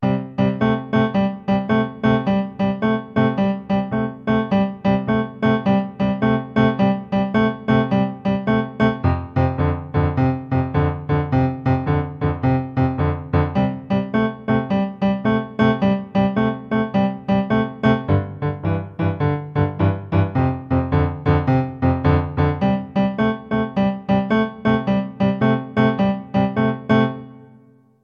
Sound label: Complete left hand, piano blues